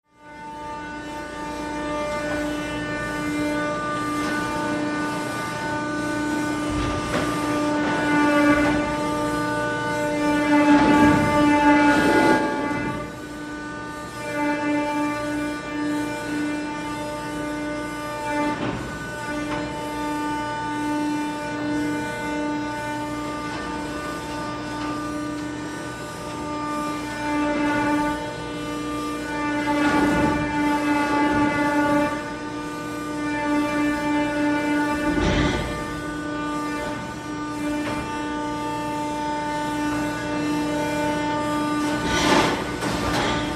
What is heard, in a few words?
Pump Room Ambience WM, machinery